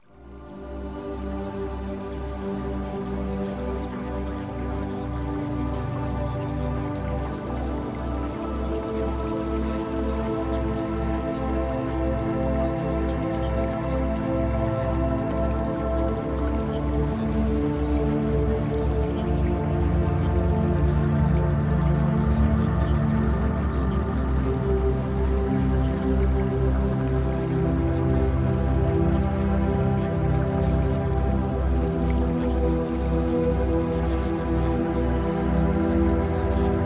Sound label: Music; Premium Subliminals